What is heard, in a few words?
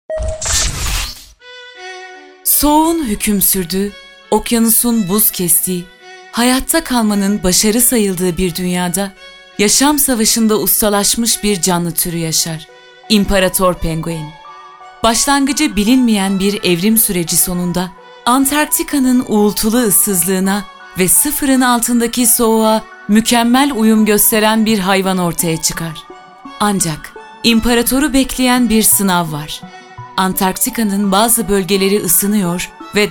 jingle